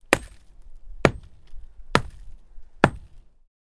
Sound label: Breaking rock with a hammer